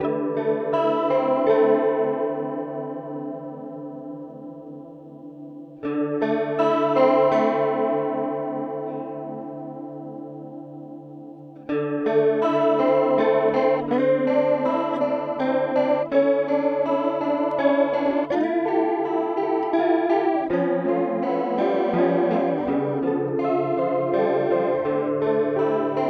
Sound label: Chorus; Astra Modulation Machine